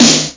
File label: snare